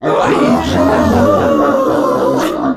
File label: mother death phase 1; finalmother